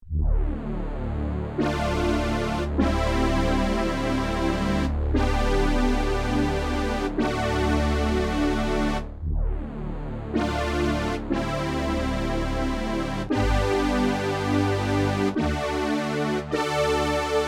Synth Set, Yamaha TX16W; Is that fat

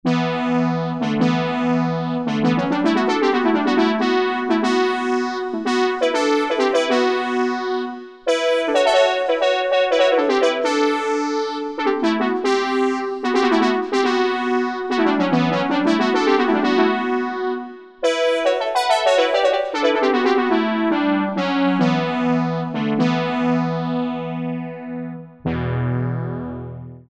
5th Brass, E-mu Proteus VX

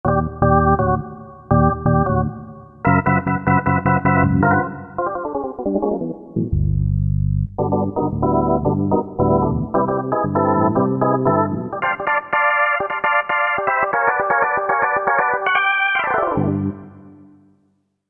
Solo, Korg MSC-9S Organ

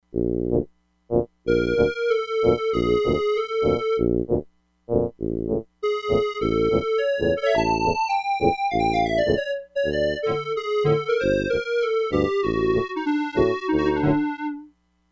DOUBLE REED - CLARINET, Crumar Bit 99; Factory Programs

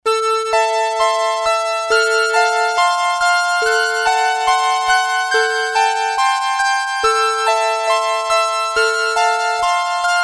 Celestial, Sequential Circuits Six-Trak; Factory Programs

